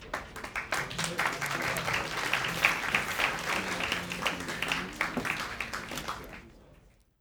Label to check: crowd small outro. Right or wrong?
Right